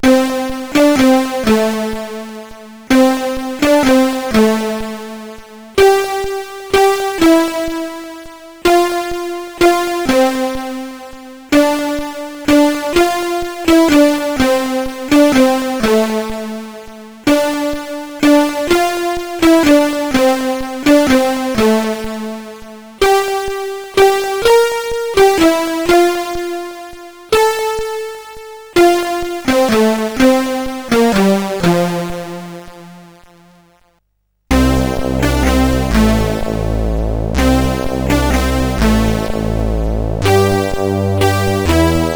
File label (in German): Stille Nacht, CSG8580R5